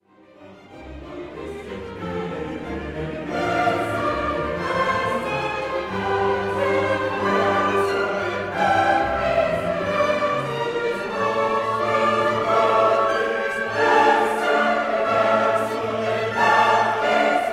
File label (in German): Schlusschor